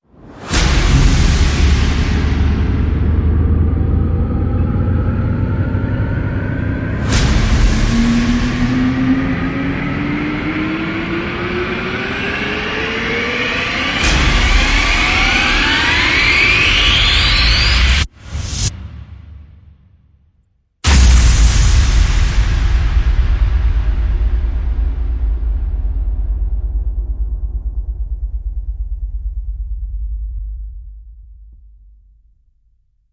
Rise Complex Cha Ching

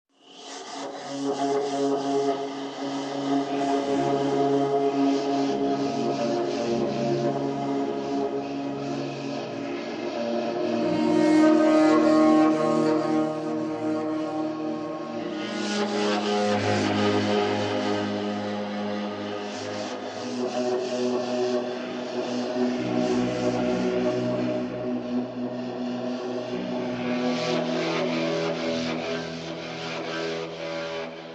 Windy SFX

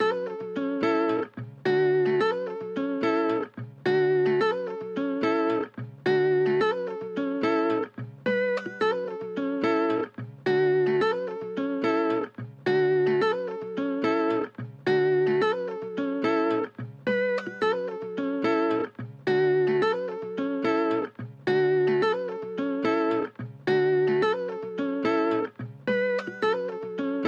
Guitar

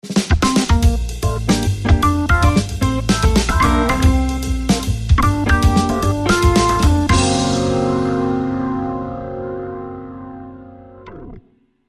Funky Organ

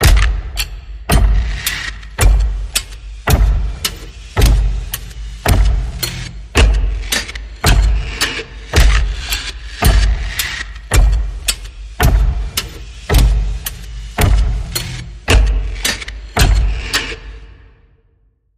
Ripping Flesh Demonic Clock

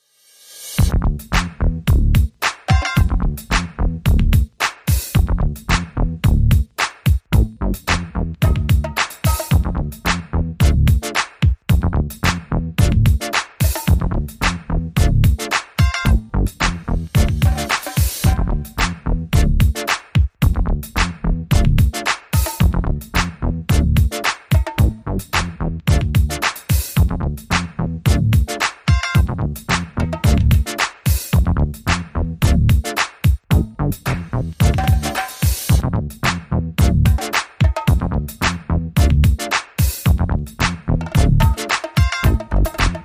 No Vocals No Scratching Mix

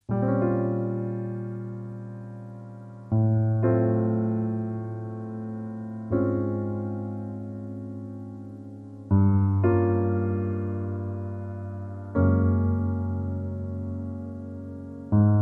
Piano Only

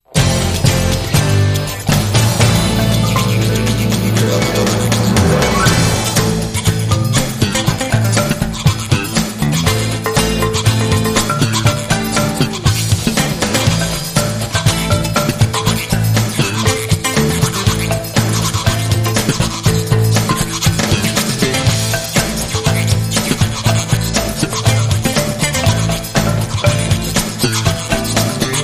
Percussive

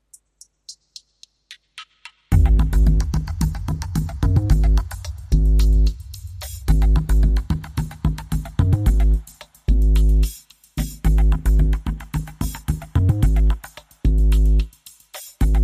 Drums and Bass

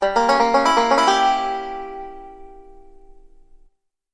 Banjo Lick

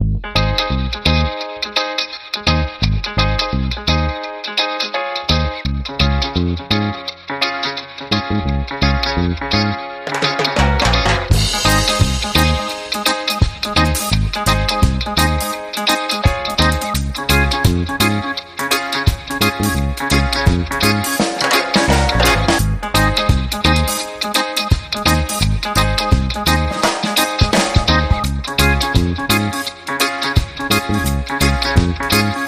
Reggae

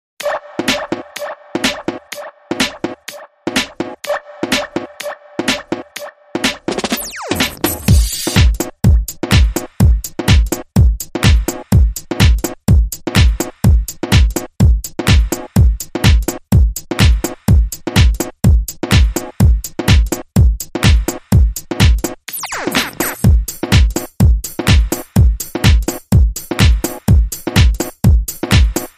Beat Drip Beat

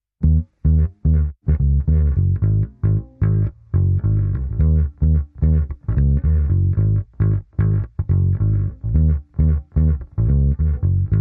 Jive Bass Go Round

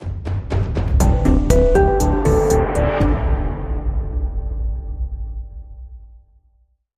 Stinger

